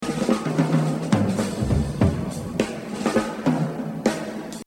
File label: Jazz Drumming; Buddy Rich; The Evolution of Jazz Drumming (Gottlieb)